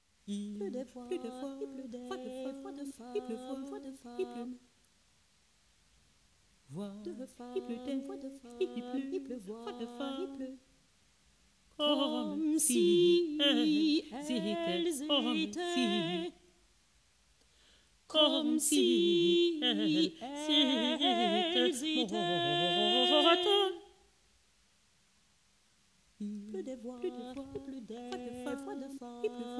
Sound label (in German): frauenstimmen